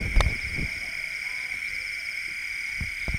night frogs, South India street recordings

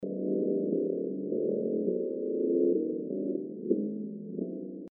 electric gamelan ALIEN GAMELAN LOOP

